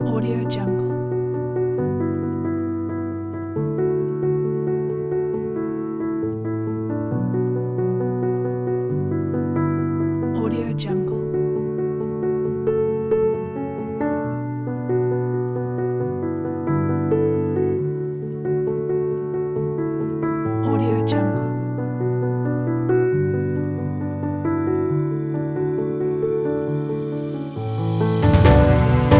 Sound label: inspirational emotional beautiful piano pack